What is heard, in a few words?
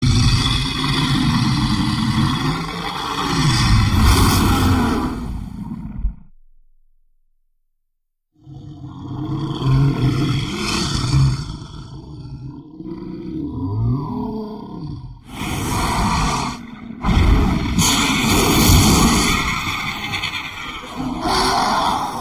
Breathing Monster; toque de celular